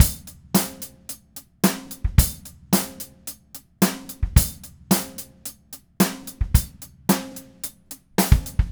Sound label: Red Maple Groove 110, DW Red Maple Kit